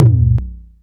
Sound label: Bassdrum, Nord Modular Drum Samples; Yawgmoth